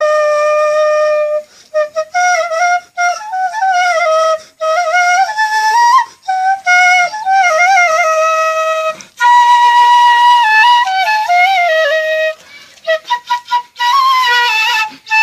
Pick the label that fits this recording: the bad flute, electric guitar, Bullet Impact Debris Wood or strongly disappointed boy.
the bad flute